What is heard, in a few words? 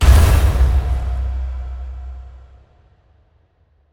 shadow kunai explode; yami